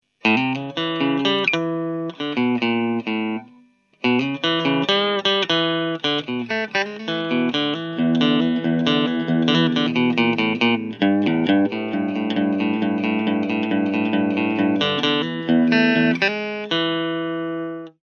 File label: Strat Clean